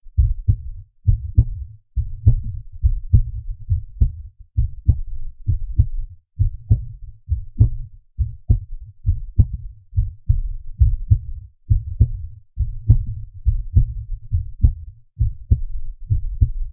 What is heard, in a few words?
Heartbeat enhanced no hiss